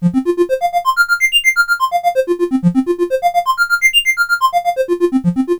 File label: synth